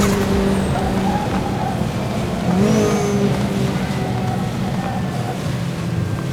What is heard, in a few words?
slowing, vehicles